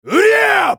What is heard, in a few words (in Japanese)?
攻撃; ナル